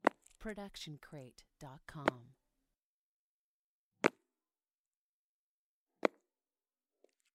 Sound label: walking outdoors single step; soundscrate